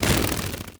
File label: buzz soft, antlion wings; creatures